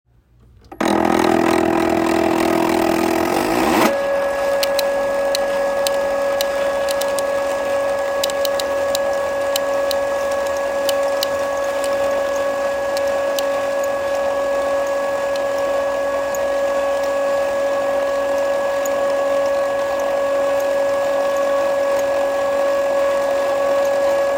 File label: 20m extension retraction